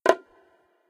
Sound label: taunt bongos stop; music; source engine; tf; video games